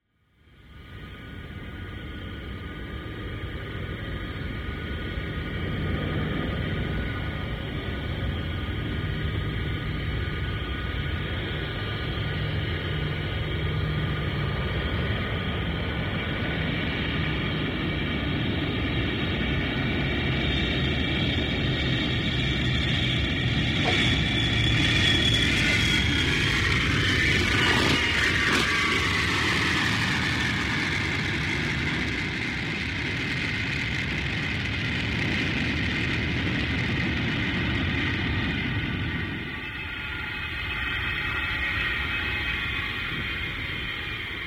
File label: 707 Landind; SOUND EFFECTS1